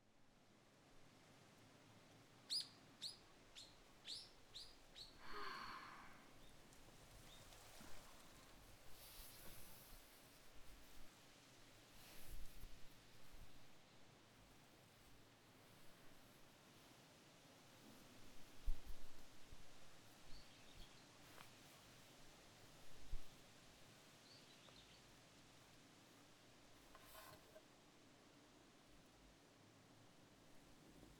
洗濯物をたたむ＆風の音、鳥が鳴く声

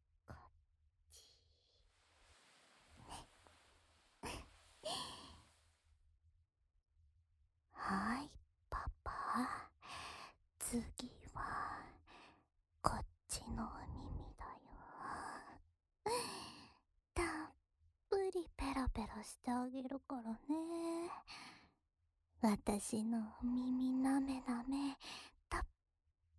耳舐めと囁き（右耳）, ASMR

